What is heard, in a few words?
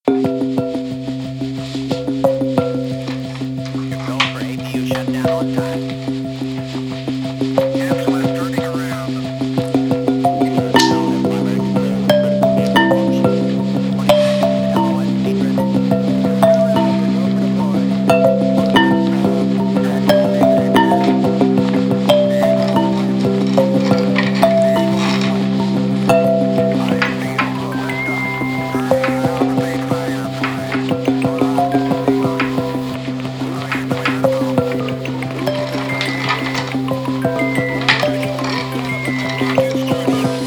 PERCUSSION